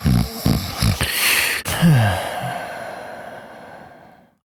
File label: Snores, Son, Vocalizations; Warframe; Deimos